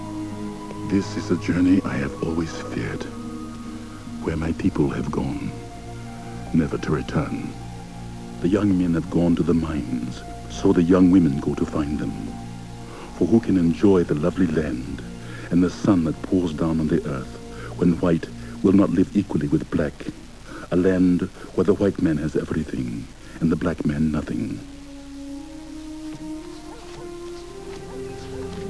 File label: cry